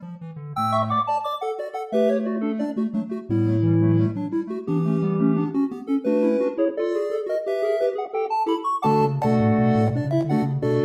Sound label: KEY Organ Flute; Synthractive; syntheway